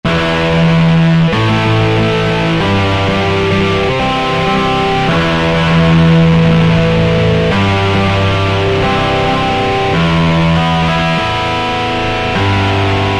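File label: Grunger, guitar, Yamaha EX5R; Factory presets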